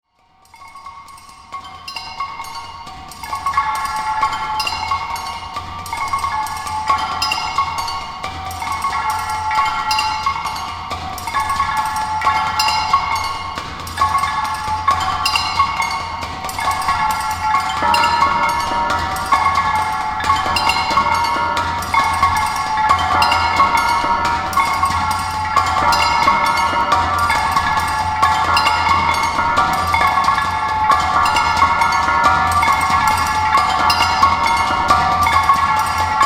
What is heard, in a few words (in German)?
glasmusik